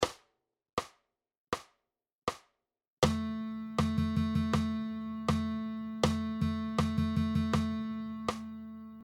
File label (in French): Dictées de rythme, guitare